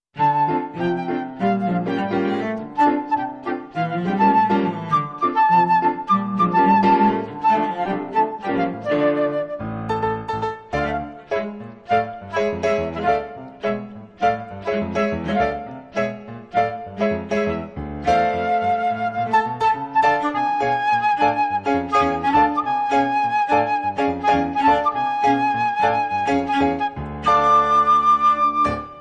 Big Band Swing; chamber-music-trio

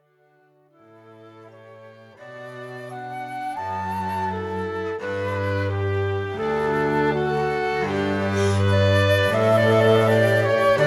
Pachabels Canon, Classical Trio

